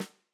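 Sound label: Tam Snare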